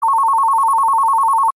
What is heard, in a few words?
Basic Tones